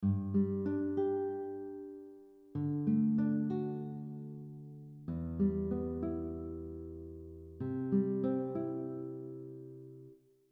Fingerpicking pattern 1 simple chord arpeggio, guitar; a simple arpeggio, which means to break up the notes of a chord instead of playing them all at once